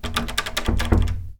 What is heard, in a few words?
door; Monster girl quest Part1,2,3; Visual Novels